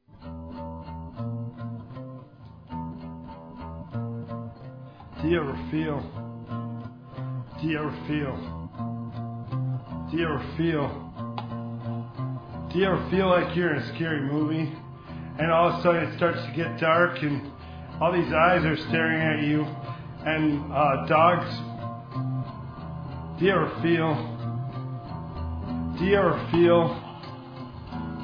Scary Techno Song

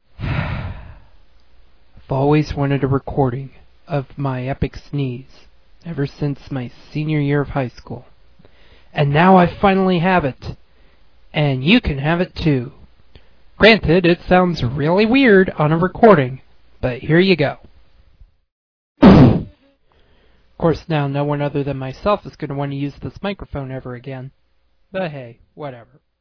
Epic Sneeze

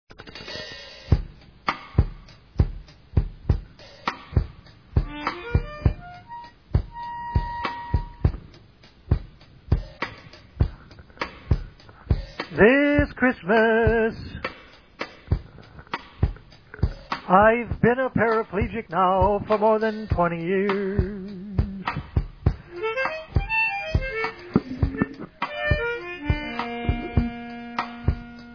Christmas Harmonica

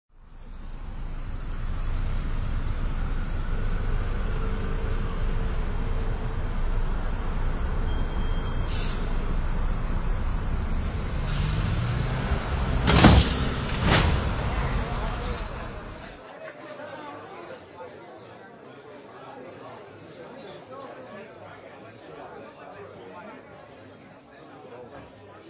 Piano Bot

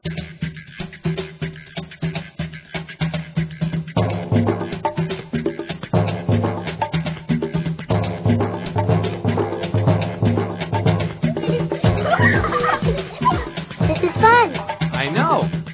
Giggles Samba

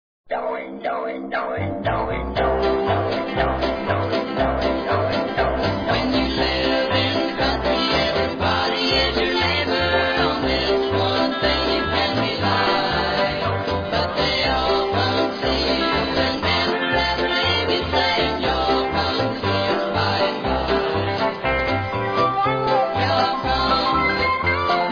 Talking Steel Guitar

